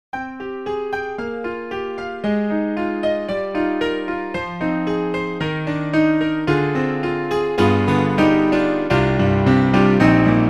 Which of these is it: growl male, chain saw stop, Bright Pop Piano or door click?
Bright Pop Piano